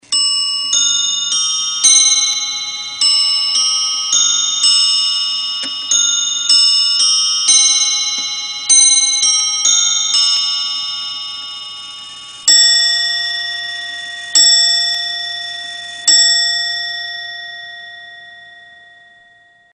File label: westminster nested bells